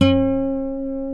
D 4 nylon, Guitars

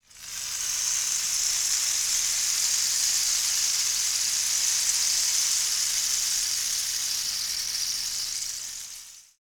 Rain Stick; Sonic Foundry (Sony Creative Software); Joe Vitale Latin Percussion

